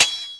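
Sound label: sword clang; AoM-ARTofMyth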